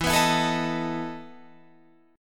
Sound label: E Major 7th Suspended 4th, Guitar, Drop D